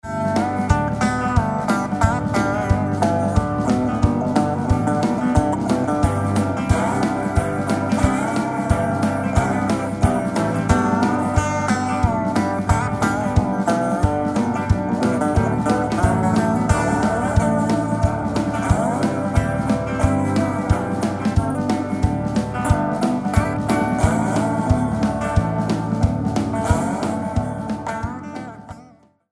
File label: cowboy ranch